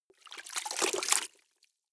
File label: clothes water updown short, haushalt; haus garten